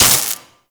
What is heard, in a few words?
Plasma Grenade Hit